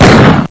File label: attack; svencoop; mommamesa